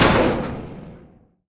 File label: plat, doors